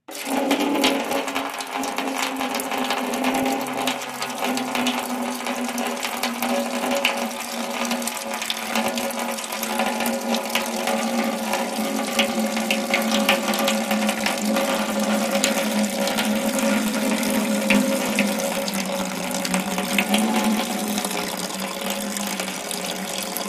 Water Stream On A Tank

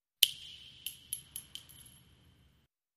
Shell Casings Single

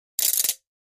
Mill Clack Wooden, percussion shaker

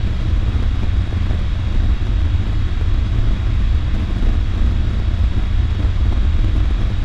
jet burn, F/A-18

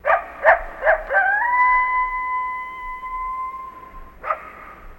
Coyote Bark And Howl High Pitch

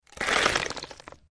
Bone Crunch Large Bon; bites

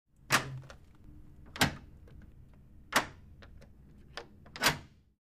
Metal Bolt Lock Turns

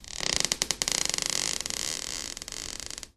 Floor Creak Linoleu, crashes & creaks

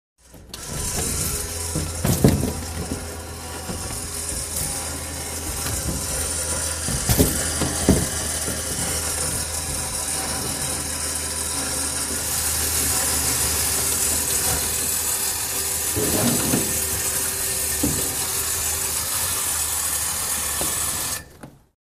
Mechanical Hoist 1; Motor Noise, Chain Noise; Wood / Metal Movement / Creaking, Close Perspective

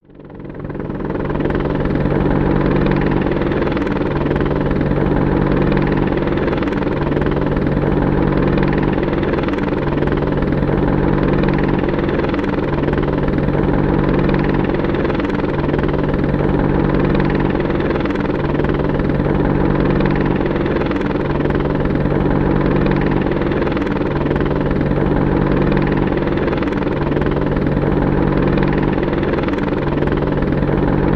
Apache Heli Hover; ah-64 apache

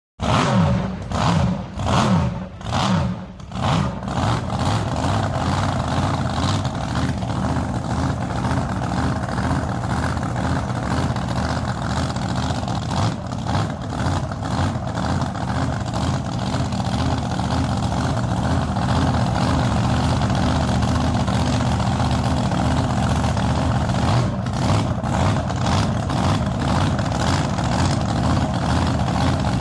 Drag Boat Start revs

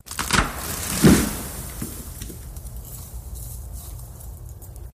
Tree Fall Snap Quick; trees

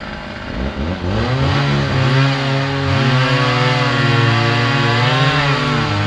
Chainsaw Cut Down; chainsaws

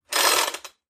Chain saw chord being pulled and released.; Tools, Hand Wood, Sawing Saw, Chain

